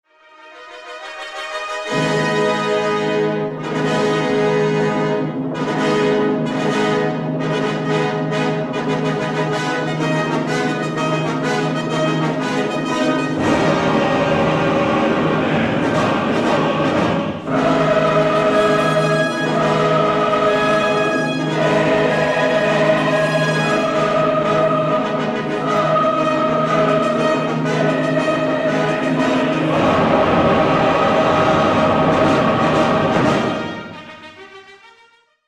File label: chorus tuba mirum